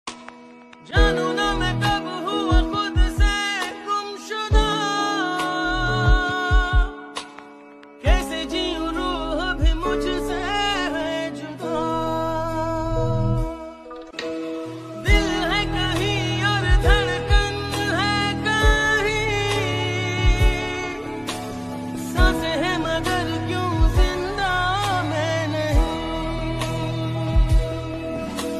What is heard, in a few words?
Sad Ringtone